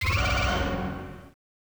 Fanfare III; Rank Unchanged BGM

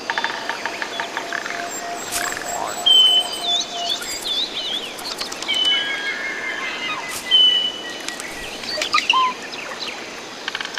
(Ambient) Clearleaf Forest

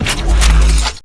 reload energy Clip In

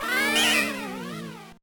Failure; Wario Land IV

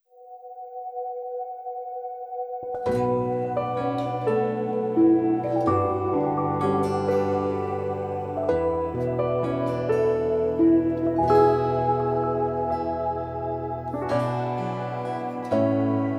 Guitar; NieR - Automata

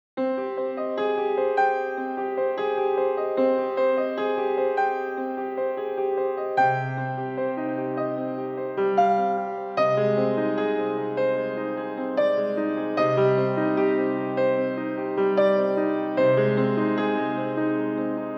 New Age Piano; America's Next Top Model

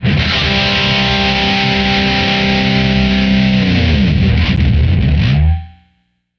Guitar Power; Back to the Future The Game; Android iOS PS3 Wii Windows Xbox 360 gamerip 2010

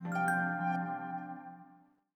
Se Log Logout, system software sounds effects ps4; PlayStation 4

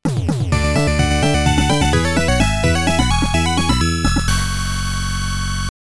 Round Clear; cratermaze turbografx 16

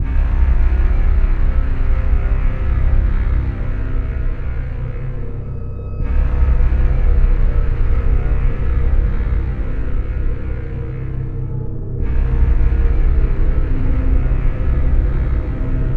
Menu Music, No SFX; Hatred

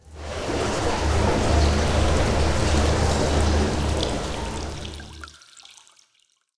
Sewer Draining; silent hill homecoming 2008 gamerip pc ps3 x360

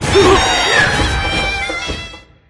City Train

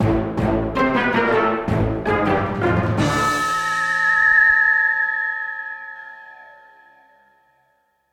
Jingle Lose; beware planet earth gamerip

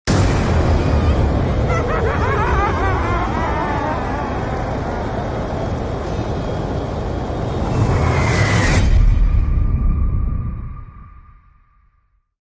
Nuketown Zombies (Game Over); Call of Duty Zombies

